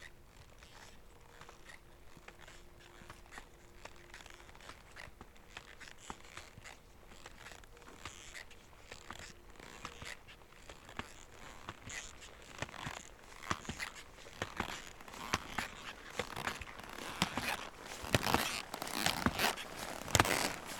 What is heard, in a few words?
Skitouring